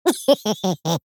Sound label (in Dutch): cartoon lachje